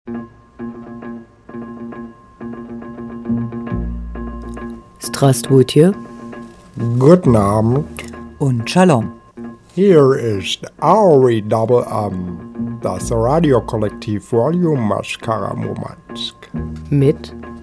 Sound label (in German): Einleitungsjingle